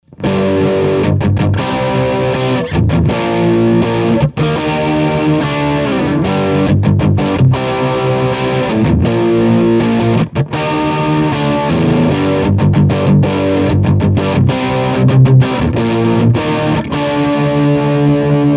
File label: low end crunch